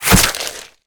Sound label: flesh, smallclaw combat abilities